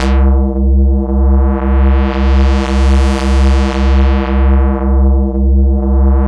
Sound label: BASS C3 R, Jungle; JGL BASS; USB Soundscan vol.05; Explosive Jungle; AKAI; 90s sample CD